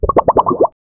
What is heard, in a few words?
sound of bubbles under, bubbles